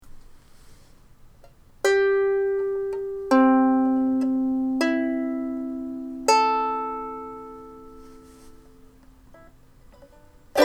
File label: Mahogany maple soprano